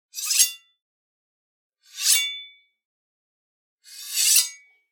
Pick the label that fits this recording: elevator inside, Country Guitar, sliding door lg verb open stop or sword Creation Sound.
sword Creation Sound